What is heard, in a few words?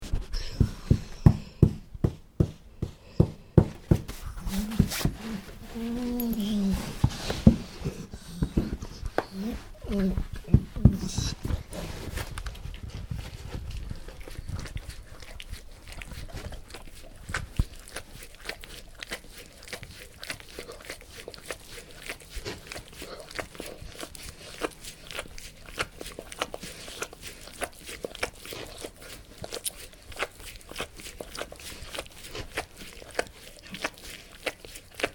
dog